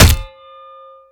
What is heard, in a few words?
knife hit